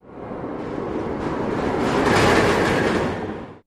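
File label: Roller Coaster Pass By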